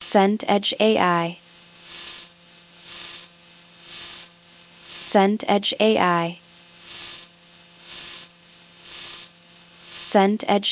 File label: snow quiet